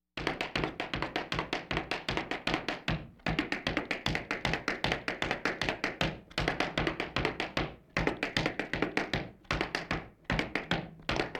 Laugh tracks; Valentino Production Sound Effects Library CD50

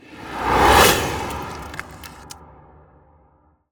Ice Spell Whoosh, Cold Whooshes; Cool Lime; Arctic Aura

